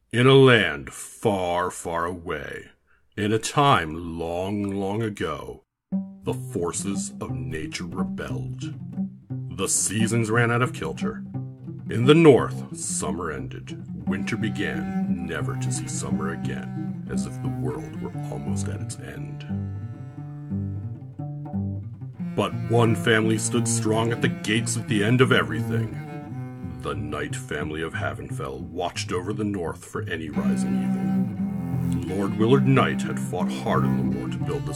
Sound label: Moans; Game of Moans